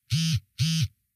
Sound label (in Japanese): keitai; 携帯スマホのバイブ音2